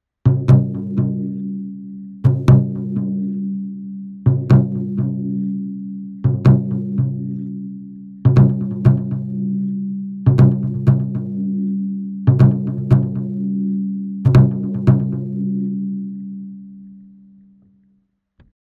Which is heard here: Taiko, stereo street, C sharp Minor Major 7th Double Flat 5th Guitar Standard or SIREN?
Taiko